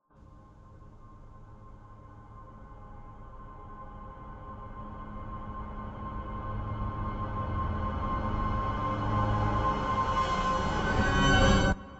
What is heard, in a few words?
Scene STEM Reverse, Complex Cinematic Horror Sounds; Federico Soler Fernandez